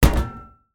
pipe hit

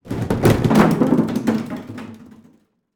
plastic container drop and roll

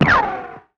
cartoon shot

